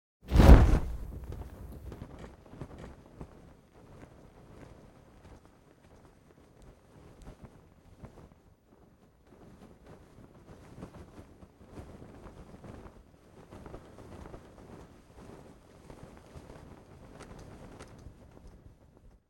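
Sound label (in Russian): Парашют раскрылся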